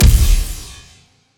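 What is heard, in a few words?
Laser gun Flange Cannon Fire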